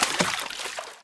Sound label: water; firefight